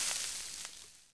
dust crumbles

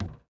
fs hMetal, footsteps

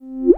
Pop Up Menu; Championship Manager 2006; XBOX

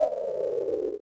HUD invincibility end; interface

